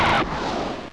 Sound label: M LAUNCH; JOSEPHIN